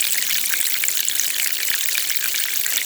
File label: leak; Haunter